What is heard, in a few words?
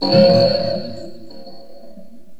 Take Off Guitar; Sonic Foundry (Sony Creative Software); Myths of Technology